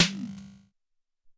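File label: Robot Snare, Alesis SR-18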